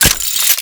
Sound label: Revolver Rifle Mech, Weapons; Warframe; Tenno; Mod Quest